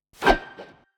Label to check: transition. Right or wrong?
Right